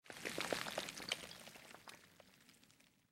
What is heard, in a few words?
Wide Crack; Blind Quest - The Frost Demon